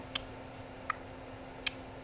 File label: clicks